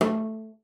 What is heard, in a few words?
CELLO FINGER A3; Xsample VOL-5